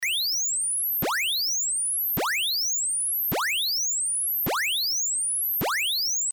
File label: square 10kHz Control; Plague Bearer